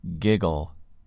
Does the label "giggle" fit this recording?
Yes